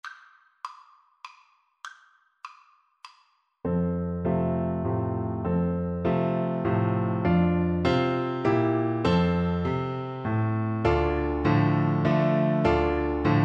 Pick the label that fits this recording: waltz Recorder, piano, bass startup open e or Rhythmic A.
waltz Recorder